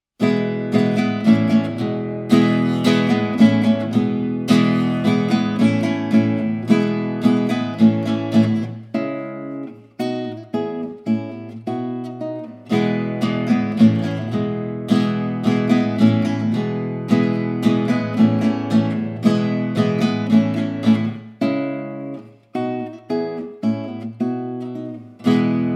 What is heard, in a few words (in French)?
la chanson des saisons instrumental